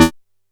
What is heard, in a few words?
E3, Bass; E-MU Producer Series Vol. 6 – Analogia Project; Boom da Bass